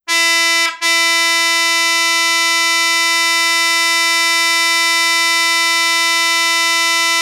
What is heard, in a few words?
stereo, marine horns